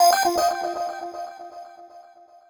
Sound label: ticket alert